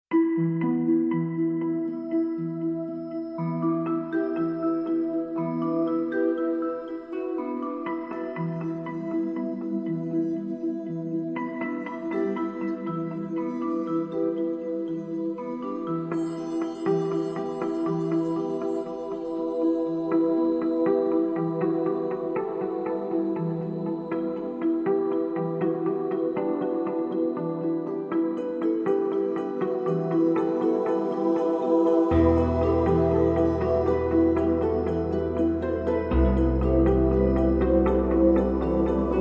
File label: Meditation